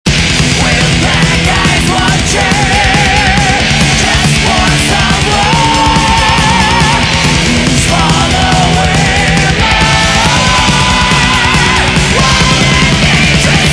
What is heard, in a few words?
the scream; rawhead rexx